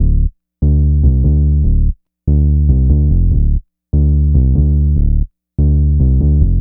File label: Analog Synth Bass, Bass Lines; Sonic Foundry (Sony Creative Software); Electronic Point Blank; Basses